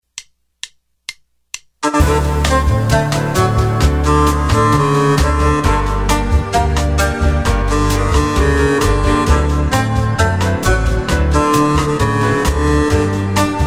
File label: Viola; Brt Viola